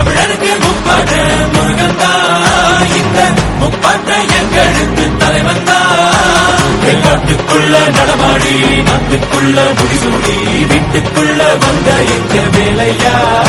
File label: murugan songs tamil